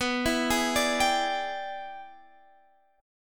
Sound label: E 7th Sharp 9th B, chord